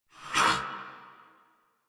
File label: Attack Effort; Warframe; Dax Knight; en